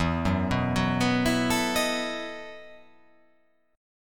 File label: E Major 7th Suspended 2nd Suspended 4th